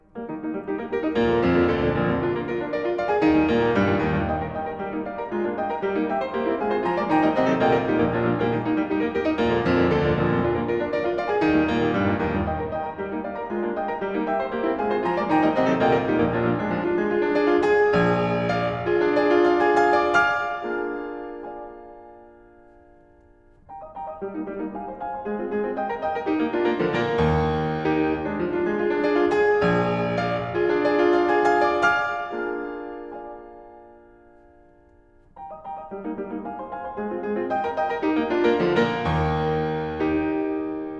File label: Variations and fugue on a theme for piano in E flat major; Beethoven